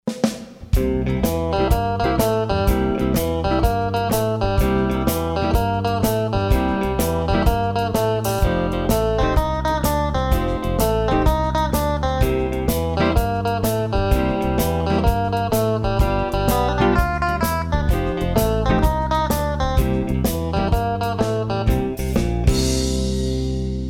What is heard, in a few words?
Classic Rock Boogie; Classic Rock Boogie 2